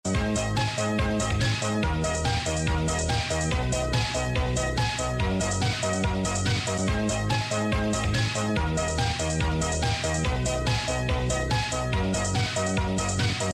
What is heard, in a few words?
company jig